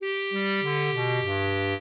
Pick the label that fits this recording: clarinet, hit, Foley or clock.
clarinet